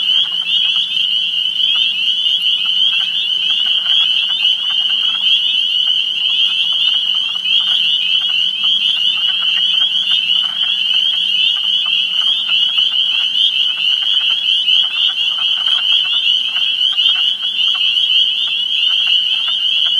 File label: Peepers